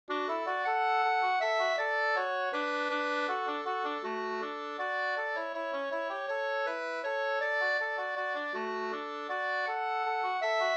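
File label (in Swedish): vals, two-part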